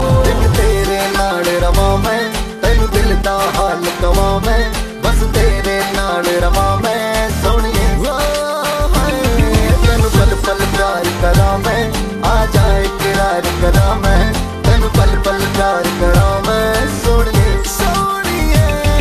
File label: Punjabi